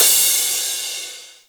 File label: Cymbal Right